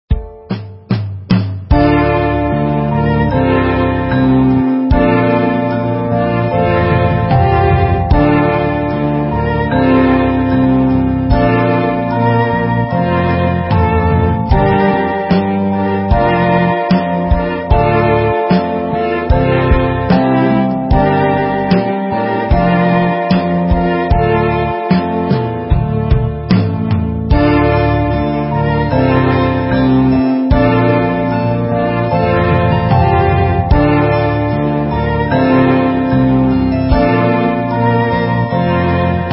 menu; inlogic-castledefense

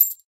coin; crossthatroad

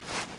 marshmallow move; frozen rush

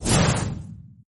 on fire; inlogic-basketballsmash

